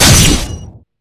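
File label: Battle Dagger Critical; Etrian Odyssey III HD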